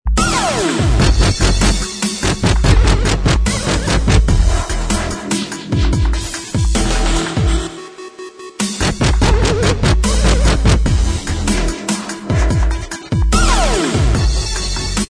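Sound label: dubstep remix instrumental track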